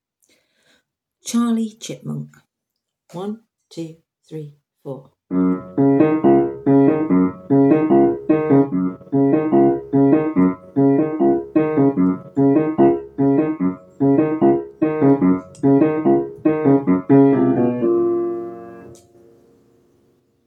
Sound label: backing track; keyboard and piano resources